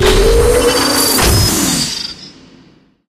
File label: breaker platform rotate